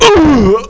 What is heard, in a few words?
Player hit; Finder Dungeon